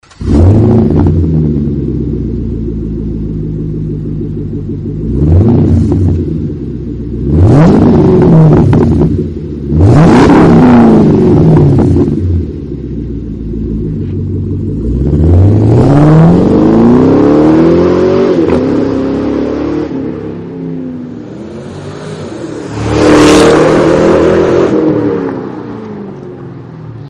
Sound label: magnaflow exhaust systems